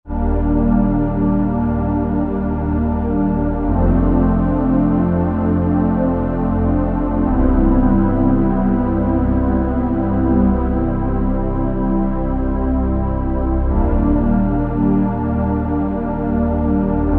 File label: Rich Dynapad, Roland JV-2080